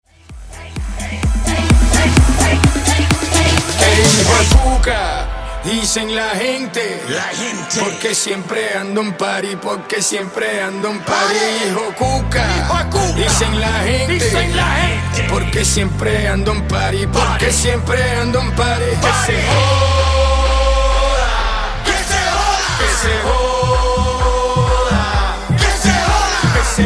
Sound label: Funk Hybrid Bootleg 128 Bpm